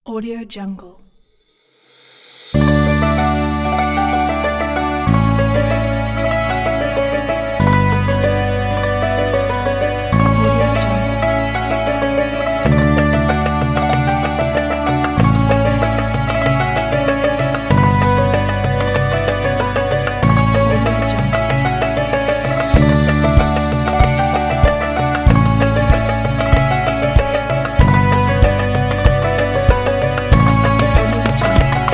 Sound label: ramadan